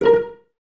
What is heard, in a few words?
Cellos Pizz A#4, String Ensembles; Miroslav Vitous